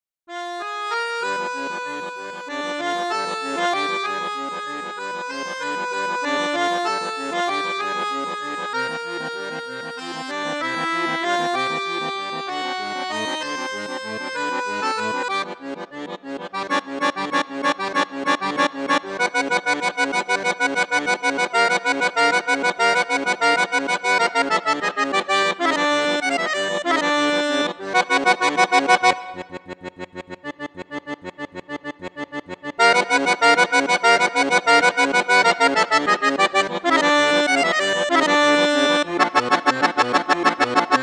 registre 16 8 4, accordeon; borsini concerto medio

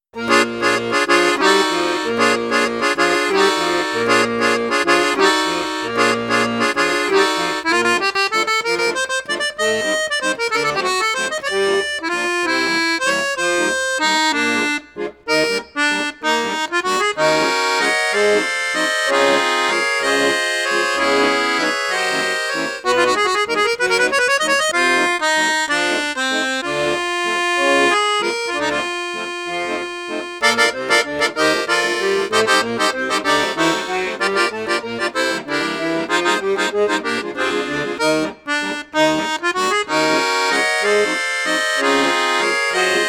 registre flute juste, accordeon; perle d'or

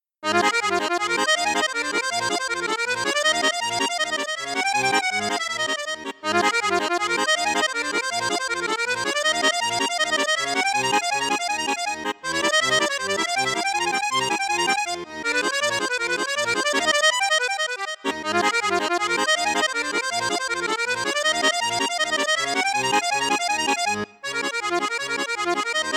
registre flute juste flute haute piccolo, accordeon; cavagnolo vedette 10; registre 2 flutes + piccolo

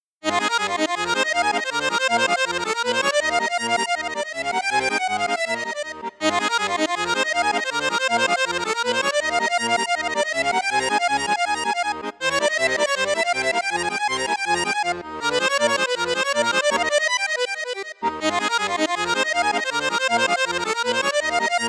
registre flute juste flute haute piccolo, accordéon; saltarelle

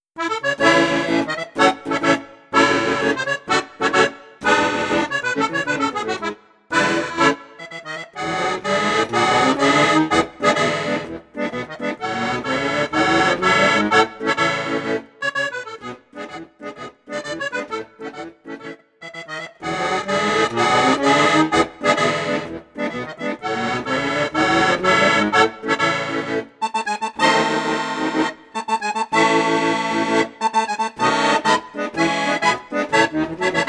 registre basson octave 3 flutes, accordéon; accordiola

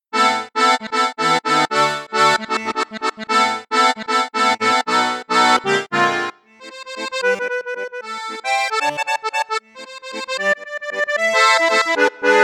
registre flute juste flute haute, accordeon; piermaria 315

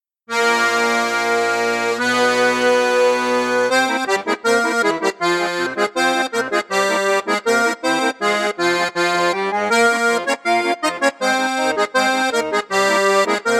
registre basson flute juste flute haute piccolo, accordéon; excelsior

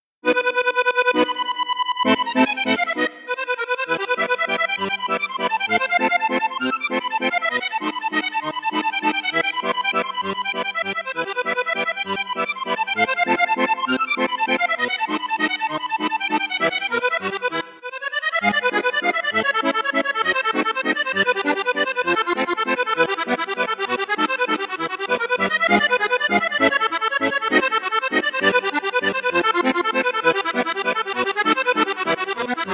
registre flute juste flute haute, accordeon; coope armoniche diamante 805

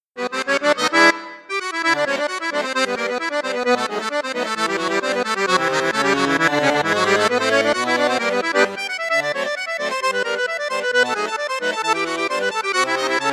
registre basson flute juste, accordeon; apanhei te cavaquinho; piermaria 312

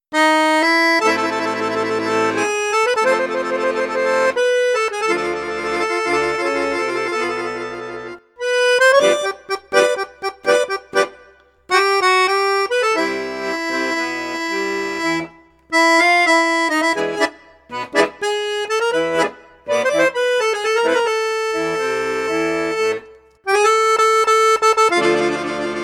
registre basson flute juste, accordeon; perle d'or

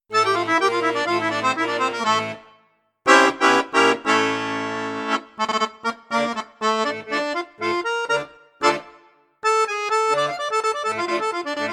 registre basson flute, accordéon; pigini star jazz

